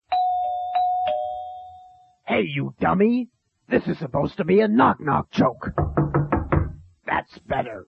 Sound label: Knock Knock Ring